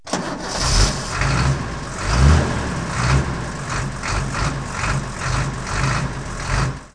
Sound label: Diesel Start Rev, City Life, Autos; KABOOM! - Wild and Wacky Sound Software; 1,000 Sounds